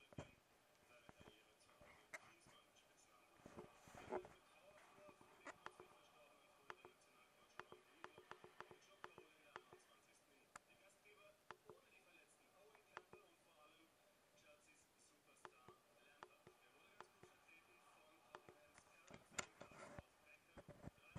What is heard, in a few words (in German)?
Nagra Knopf; Nagra Ares M; Flashkartenrekorder